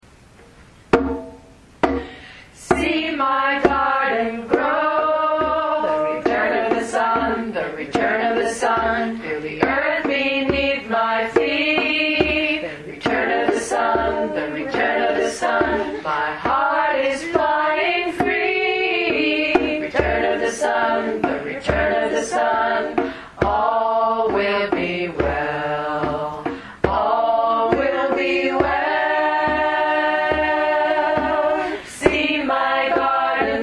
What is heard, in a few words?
Chant